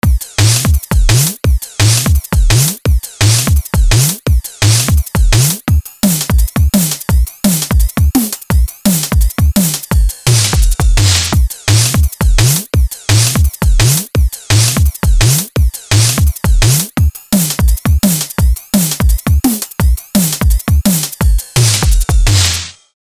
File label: dnb beat 170